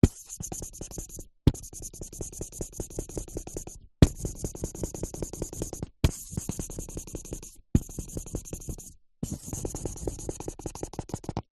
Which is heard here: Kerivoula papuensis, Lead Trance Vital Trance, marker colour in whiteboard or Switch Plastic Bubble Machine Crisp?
marker colour in whiteboard